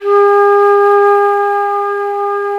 G#3 FL, FLUTE; AKAI S-Series CD-ROM Sound Library VOL-7

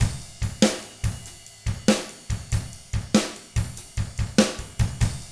rock 6/8, drums; ILIO; Double Platinum Drums 1

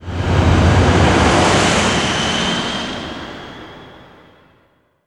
NOISE, Production Effects

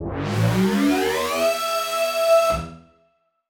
Siren Horn Type Hits Ramp Down E; ProfMash; future rave

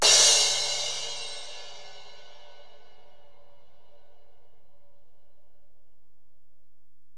Ride, Alesis DM-PRO

